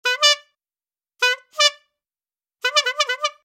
airhorn clown full

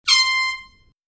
high fart short, airhorn

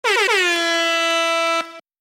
airhorn triple tap

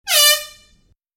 airhorn mid short

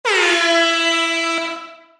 reverb, airhorn

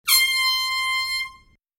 high fart long, airhorn

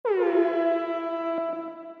airhorn distant

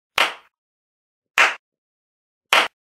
slow clap

